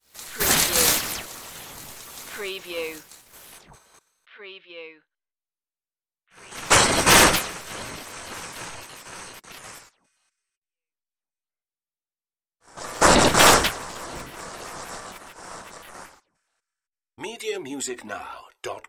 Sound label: electric digital glitches